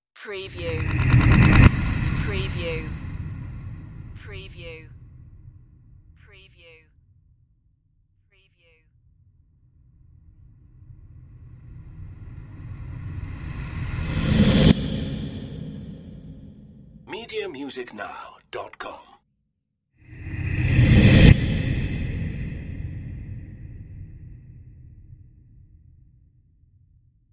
scary whooshes

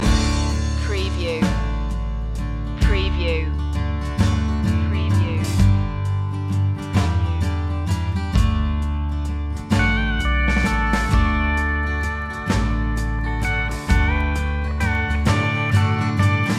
goodnight waltz loop

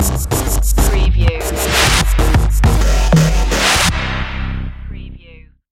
dubstep stinger

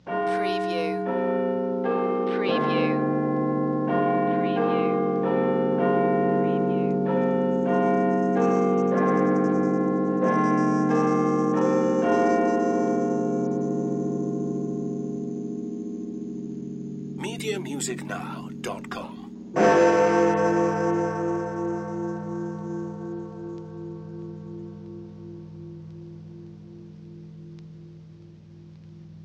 Tower Bell One O'Clock

